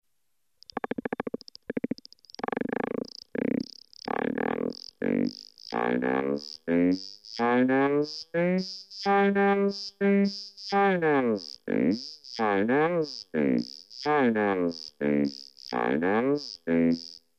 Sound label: Vocorder